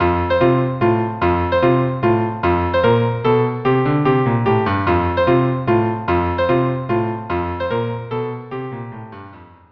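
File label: viola cello duo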